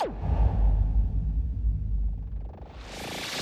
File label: Boss Laser Hit